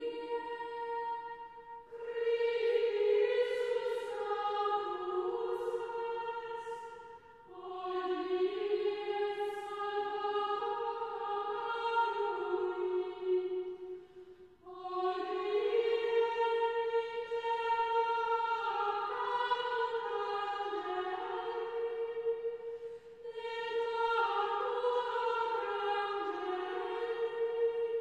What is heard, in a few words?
Chant Antiphon; Traditional; Chester Processional